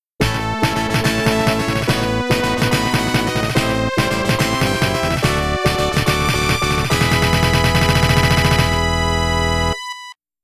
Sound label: Monster Stage Clear